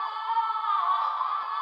SCI-FI

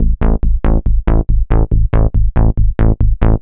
bass, dance

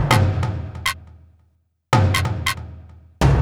Perc Loop, Dance, Beats

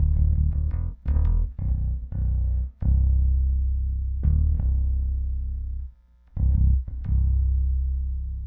Metal Deep Bass; Rock

